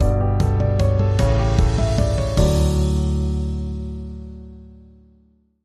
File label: Bumper